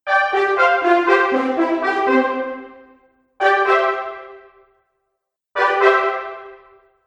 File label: Fanfare; Musical; Fanfare Collection